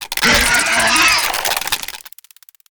Spider Trap Activate; Evolve; Gorgon

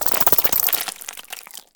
Ability Spider Trap Crawl Start; Lurker; Evolve; Gorgon

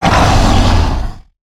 Leap Attack; Evolve; Goliath

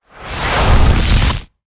Chain Lightning Fire Ramp; Evolve; Elder Kraken

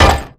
Hit Closed; Thanatos; Terraria

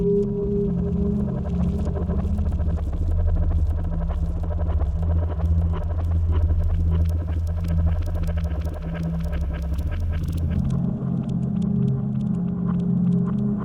Spirit Walk Loop; Lurker; Evolve; Gorgon

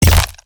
Melee Impact; Evolve; Wraith

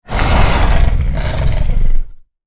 Pain Light Breaths; Evolve; Goliath

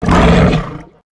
Exertion Mortar; Evolve; Behemoth

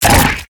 Exertion Light; Evolve; Gorgon

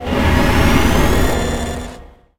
Destroyer Charge Up; Terraria

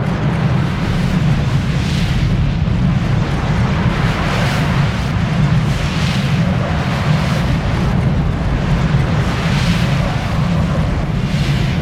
Lightning Strike Activate Start Loop; Evolve; Kraken

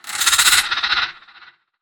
Lurker Sniff; Evolve; Gorgon

